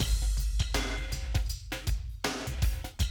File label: Classic DrumLoops